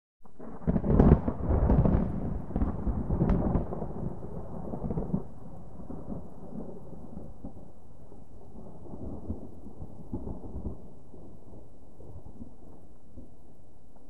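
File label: Thunder Low Rippling, distant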